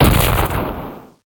trash destroy

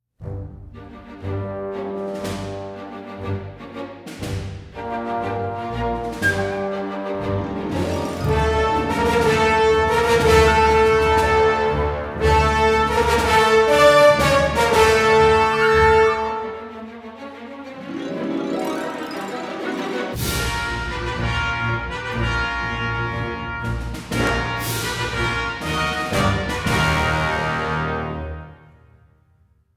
Event Theme Stinger Imperial; Makeb

